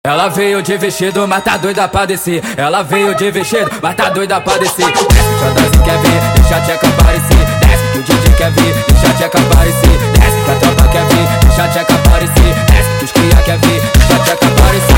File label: Glockada sped Up